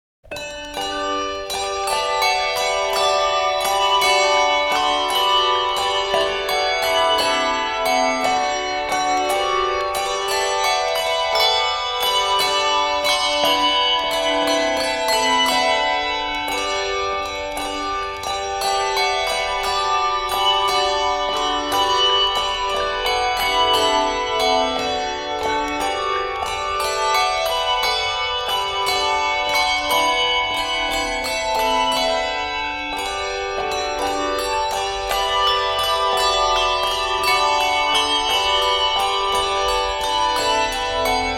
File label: Handbell, Christmas Handbells